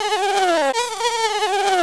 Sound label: FLY BUZZ